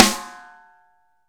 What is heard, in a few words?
DRY KIT, Drums; Real Mega Drums